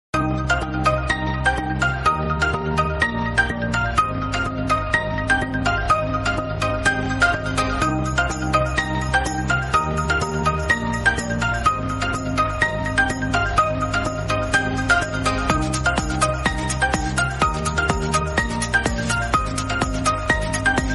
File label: infinix ringtone original